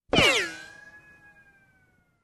Wild West Revolver shot

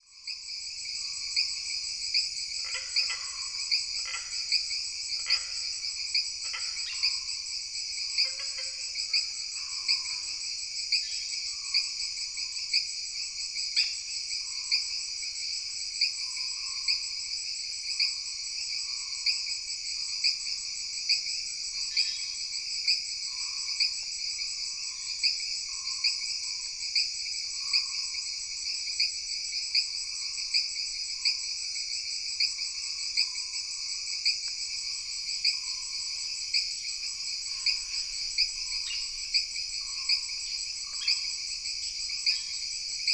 Jungle Insects With Frogs

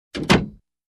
Wooden Door close

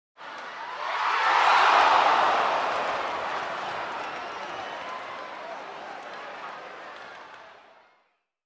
Crowd Surprise reaction

